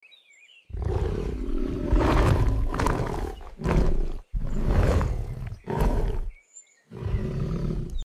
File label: tiger roar cinematic growling